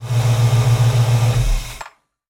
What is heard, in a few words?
Mustang 68 Fastback Shut Off Engine Perspective